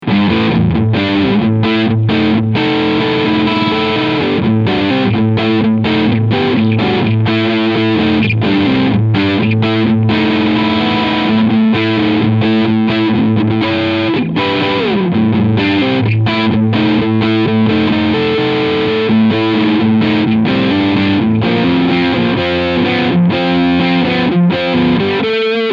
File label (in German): Gibson Les Paul Standard 50s Crunchsound beide Pickups